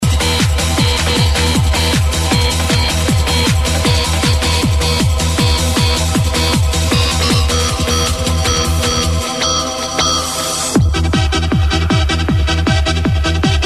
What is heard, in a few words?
trance